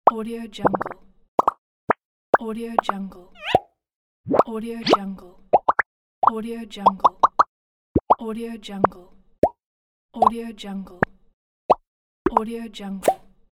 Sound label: pop sound effects pack